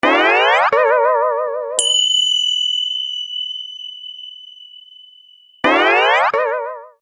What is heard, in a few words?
Cartoon Alert; The Weakest Link